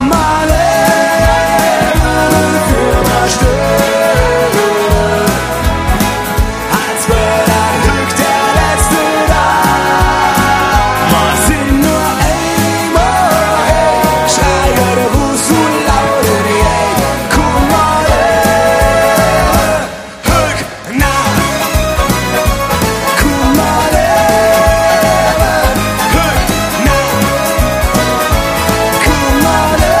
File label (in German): live mit orchester